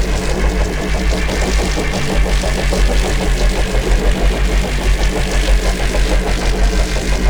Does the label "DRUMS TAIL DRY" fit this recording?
No